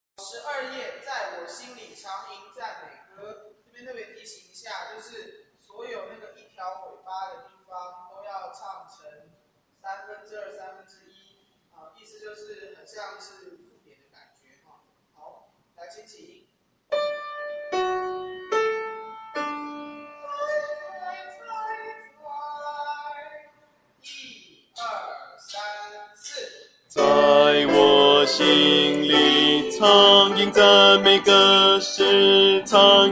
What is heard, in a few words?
bass